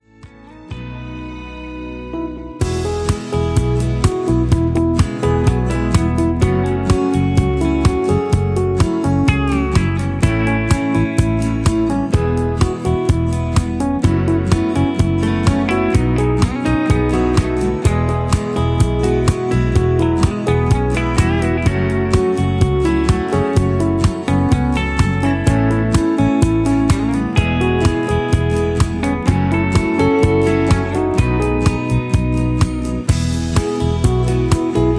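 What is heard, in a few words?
Instrumental with background vocals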